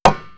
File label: Hit Lock; Wild West Shootout (Arcade) (gamerip) (2019)